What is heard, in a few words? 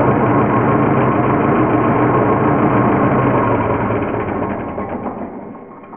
farmall stop